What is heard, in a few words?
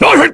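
Damage, Korean; Phillop; kingsraid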